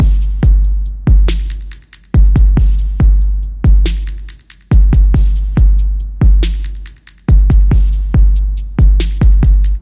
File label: Sinister Tone, Percussion Only